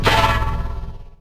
Granular

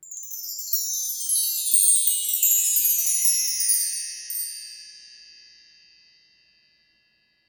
Chimes High To Low Slow

